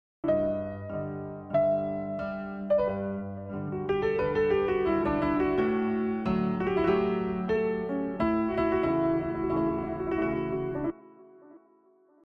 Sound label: piano on